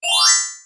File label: Freeze All Kill; MilkChoco